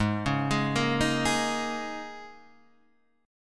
G sharp Suspended 2nd Suspended 4th, G sharp, down